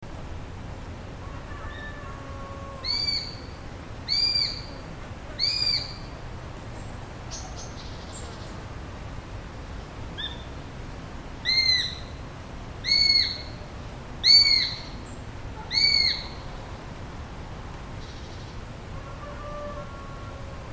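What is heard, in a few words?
perepelyatnik golos, sparrowhawk voice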